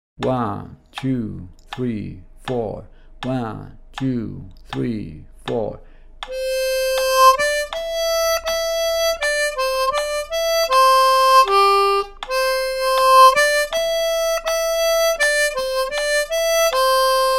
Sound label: 80 bpm, harmonica; Aloutte